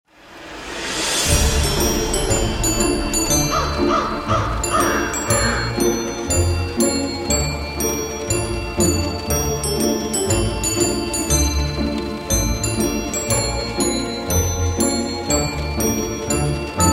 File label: A Horrifying Tale